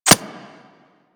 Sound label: Arrow Impact Default; Warframe; Weapons